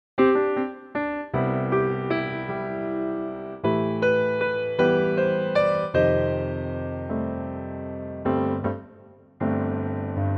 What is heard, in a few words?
C piano; key - C